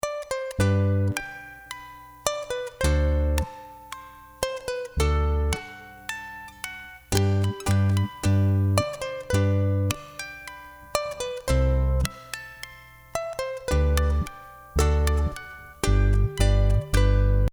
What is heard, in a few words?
Glockenspiel kulele, ukulele ringtone; Papagenos